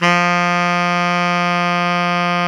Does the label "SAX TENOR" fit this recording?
Yes